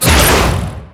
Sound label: suplex jump